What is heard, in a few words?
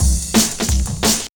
175, Breaks; Drum&Bass; EZ Rollers